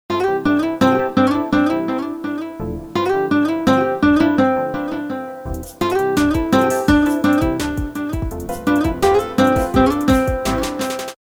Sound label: Guitar